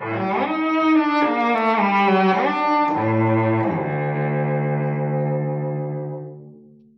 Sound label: strings, sad loop